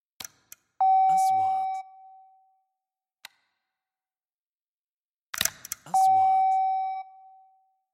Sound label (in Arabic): نغمة تنبيه خطأ; transitions